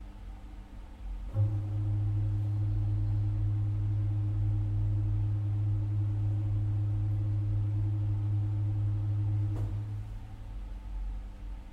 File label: soubasse; orgue; Pedalier; Ce petit orgue